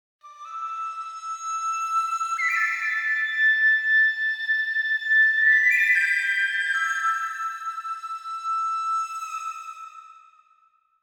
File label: Scene Music; Age of Wushu; Windows